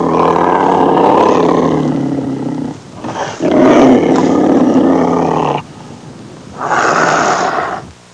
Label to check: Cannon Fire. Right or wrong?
Wrong